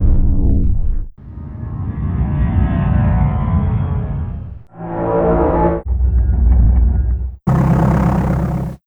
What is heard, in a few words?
cloak